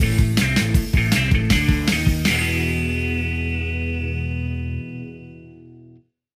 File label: Level Up